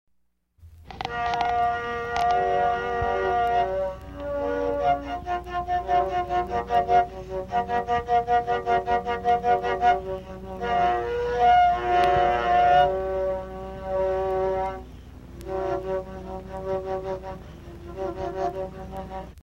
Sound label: Camayura Sacred Flutes